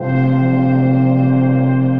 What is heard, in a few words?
Ethereal Pads; Sepia Tones; Ableton; Mood Reel